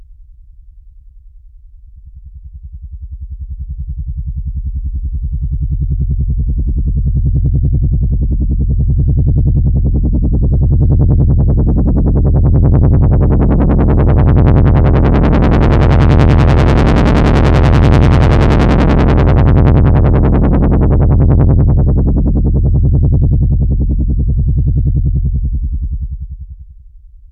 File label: helicopter